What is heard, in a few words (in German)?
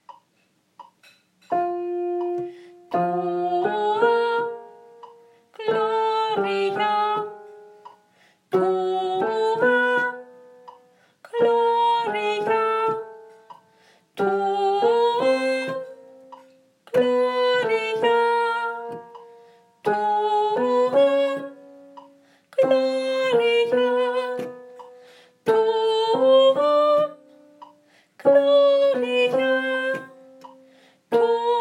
Gloria, Tenor Übung; Tenor-Übung (Takt 35-36); Messe; Chor